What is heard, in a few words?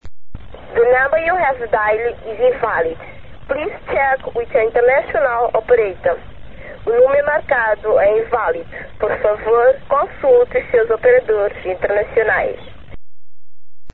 mozambique, international telephone sounds